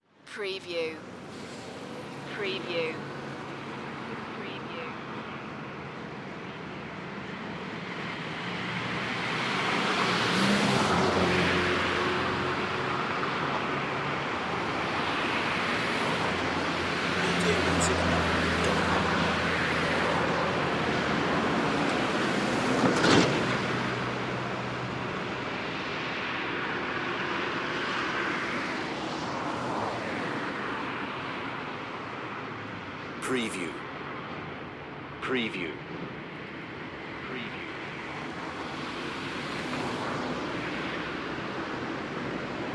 Medium Traffic Ambience

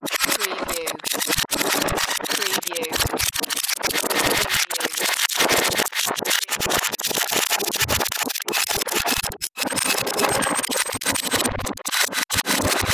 static transmission glitch

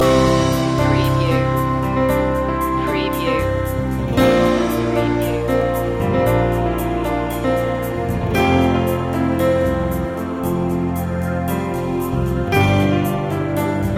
corporate achievement loop

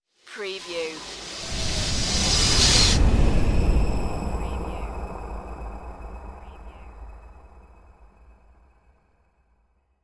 evil dark rising

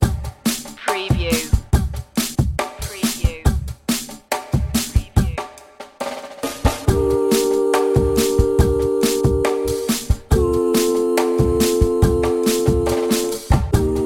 street angels 70bpm

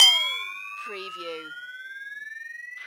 CARTOON RISING BELL

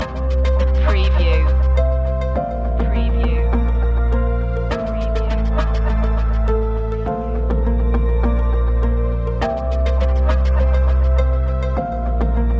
emotional sunrise loop

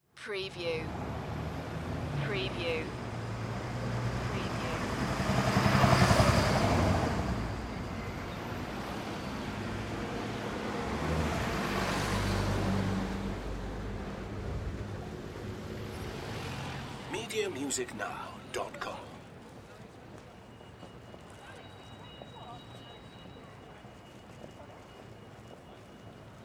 London Street Traffic; sound-effects-bible

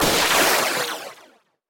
Magical Whoosh Filter Flange

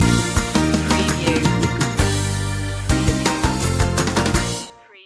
kids in the sun stinger

